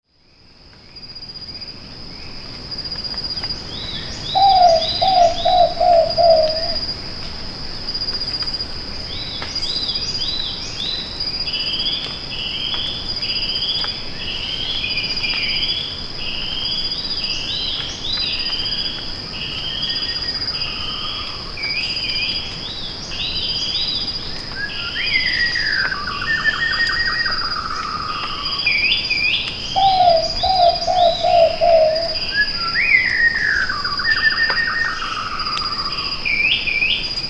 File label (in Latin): pitta kochi, ptaki; kurtaczek wąsaty